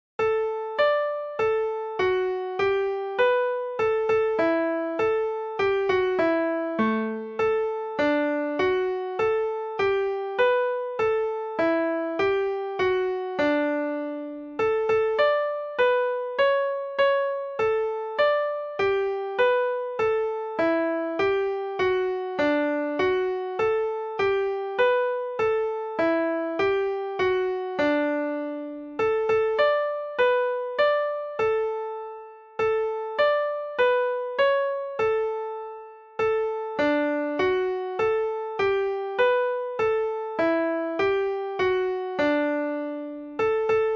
BELL RING

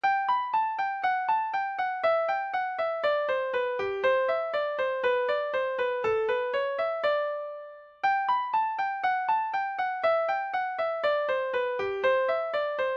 blackberry blossom a part (melody)

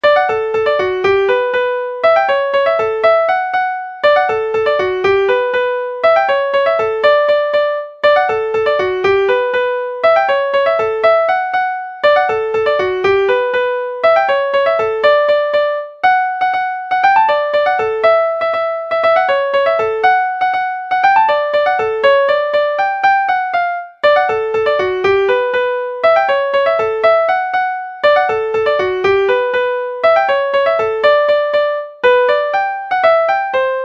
polka; briton

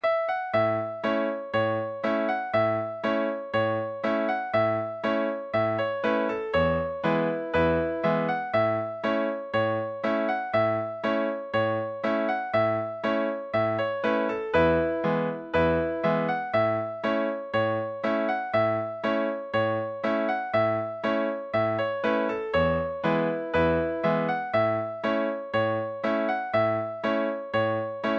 crow creek, session tune

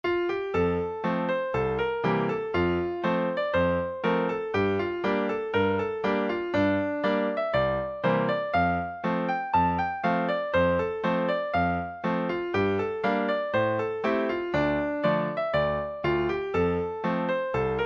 drums; dumbartons; scottish

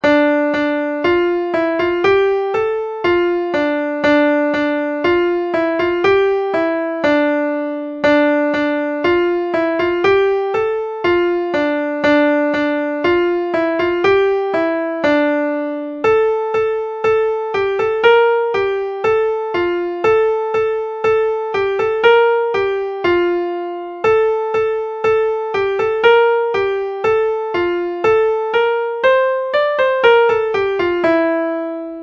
eight step waltz

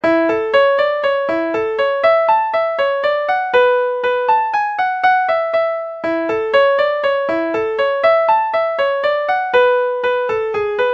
mazurka; john dohertys

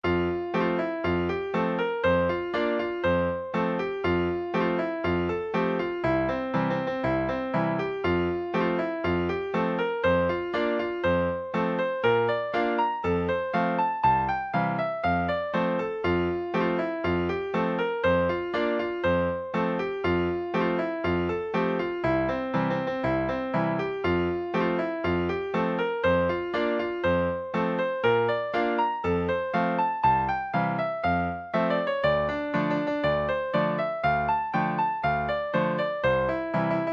celtic cousins hornpipe

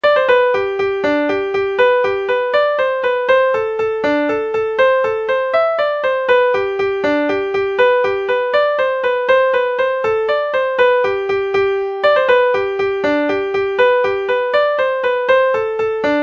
irish jig; wash-woman

